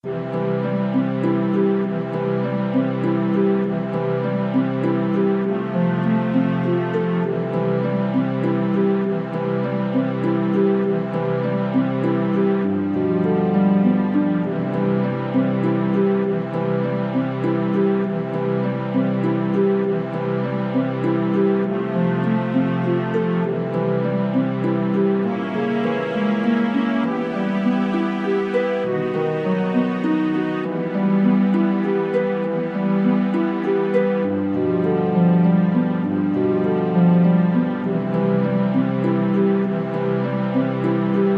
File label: o holy night easy